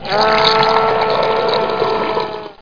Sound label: drown; Game Zone; 3D UZ